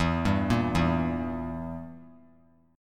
E Diminished down; Edim arpeggiated; JGuitar

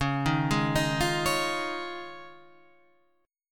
F Minor 7th Sharp 5th, Db

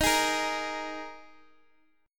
Eb Suspended 4th Eb 4G 5C 5E 5A

